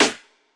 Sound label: swing snare; Hiphop